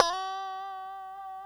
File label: G#4 FUNK SLD, GUITARS, FUNK STRAT; Spectrasonics; Hans Zimmer Guitars VOL-1